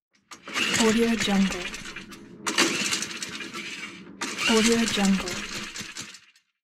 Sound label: lawn mower start